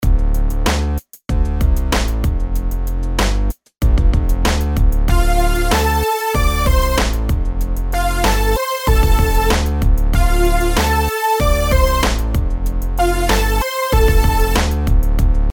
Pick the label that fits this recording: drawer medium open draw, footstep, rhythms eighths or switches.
rhythms eighths